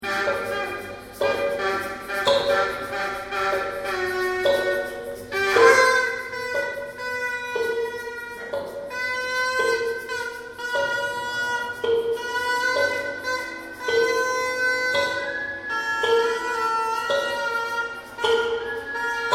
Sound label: Noise Orchestra